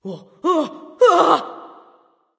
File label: fear; smite; skadi